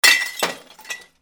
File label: BREAKING